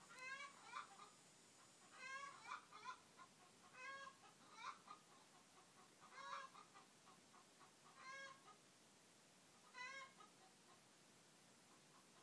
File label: morning chicken trio